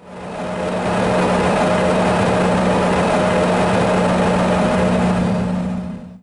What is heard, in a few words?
gen run